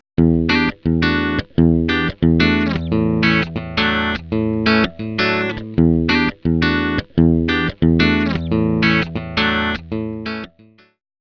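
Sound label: classical guitar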